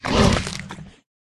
die; ukrotitelj

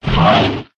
werewolf boss wound

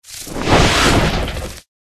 Pet Butterfly

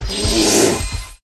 White Bear

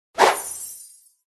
cat attack middle

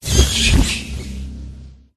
bat

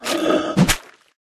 attack middle; zermerh

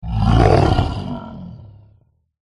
polar bear attack voice middle

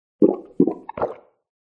drink

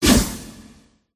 cat attack contact middle

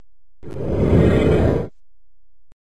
attack middle

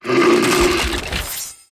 IceBear Die

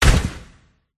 attack contact

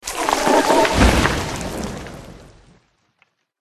death

